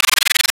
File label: SAMP HOLD, FAIRLIGHT CMI IIX, ANALOG